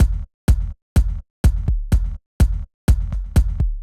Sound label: 125 Drum Kit; Renegade